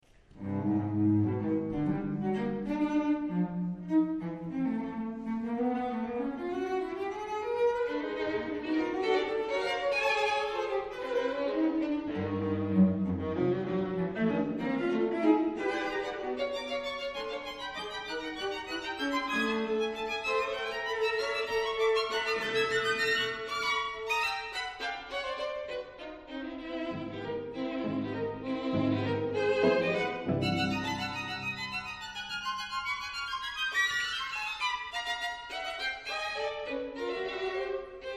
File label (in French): Quatuor à cordes; Frank Martin